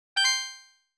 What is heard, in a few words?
SOUND COIN